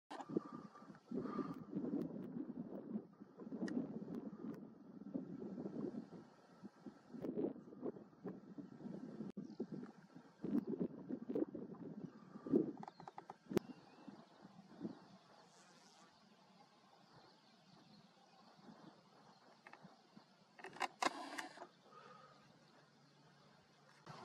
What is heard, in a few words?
Houbara; هوبره